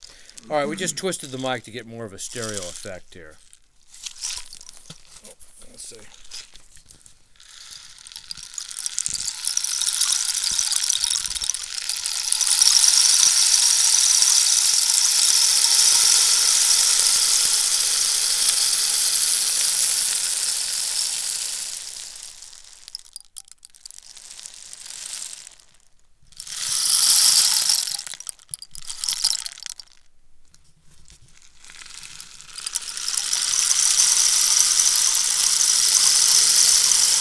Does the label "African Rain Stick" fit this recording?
Yes